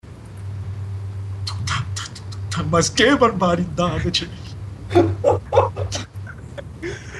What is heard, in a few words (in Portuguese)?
funk gaucho